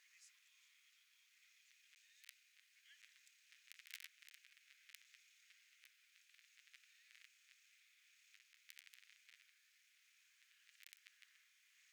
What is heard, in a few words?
DRONES